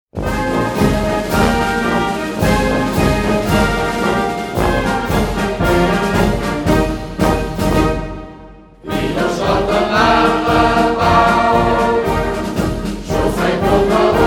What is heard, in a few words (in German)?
narrenbaumlied gesungen